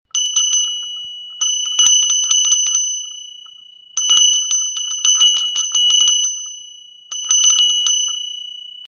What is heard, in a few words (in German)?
Glocke Klang